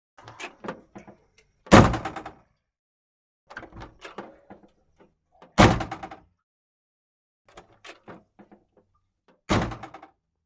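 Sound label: Truck cab door opening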